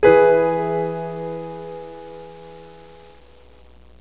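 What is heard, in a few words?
PIANO CHORD E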